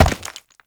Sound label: Uriel Step Rock; Warframe; Movement